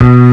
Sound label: bass; osu